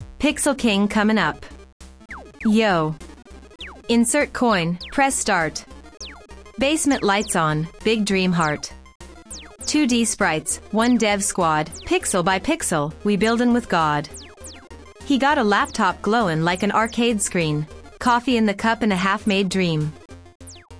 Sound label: vocals forward mix